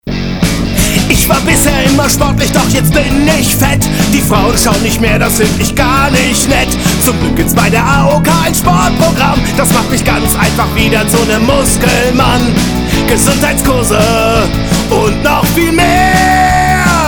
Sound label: Vocals